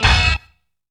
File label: SUDDEN STAB; Zero-G; Funky Elements